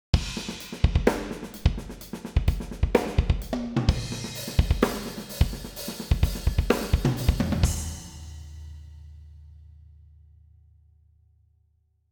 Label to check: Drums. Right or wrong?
Right